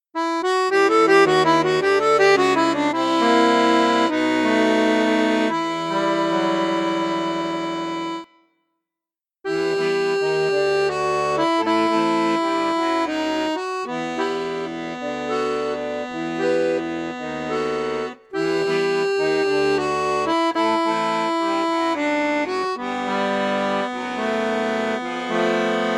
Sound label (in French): registre basson, accordeon; cavagnolo professionnel 12